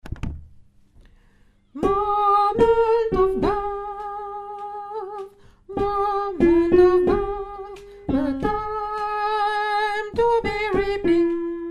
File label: tenor